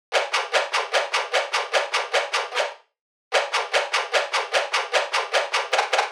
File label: Drum Loop - 150 BPM Shaker; Experimental Drum Loops; Loop Stems; Cymatics; Mystery Pack Vol 4; Arrival Drum Loop